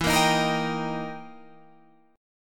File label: E Minor Major 7th Flat 5th, Guitar, Drop D; chord shape